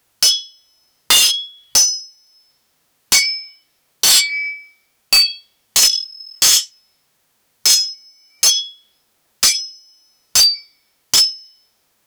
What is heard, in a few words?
sound of the the clash, battlefield